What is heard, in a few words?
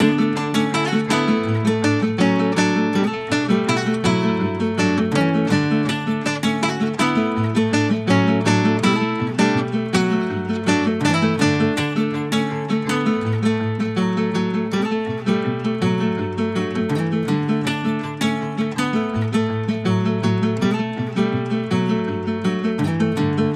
163 BPM D Maj, Guitar Loops; Cymatics; Journey Guitar Loops